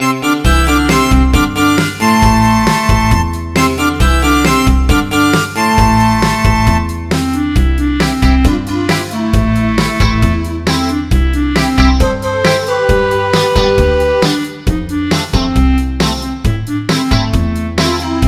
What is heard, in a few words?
Fantasy INSTRUMENTAL